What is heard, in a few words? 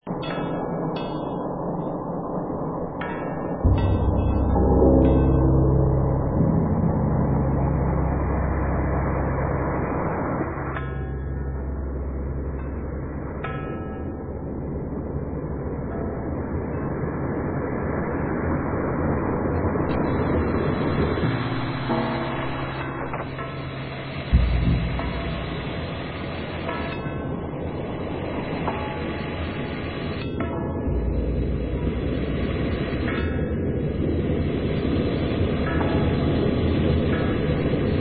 Gamelan